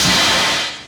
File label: Crash; 99Sounds; Dark Engine; Burnt Hits; Hats